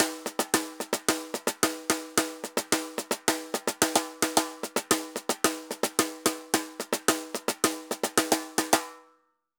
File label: Tambor Murga 110 bpm; Best Service; Festa Latina VOL-1